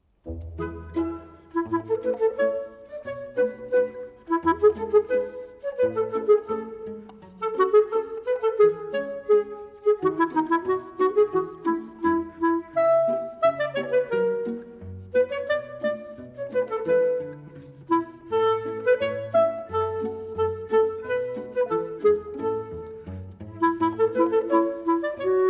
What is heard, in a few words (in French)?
clarinette; la comptine des electrons mille tours; edison